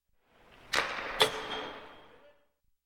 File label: Hockey Slap Shot; Sound Ideas 2000 Series